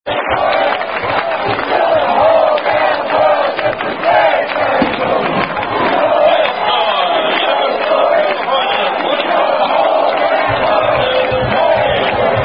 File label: Football Chant; Whole Damned World This Is ------ Territory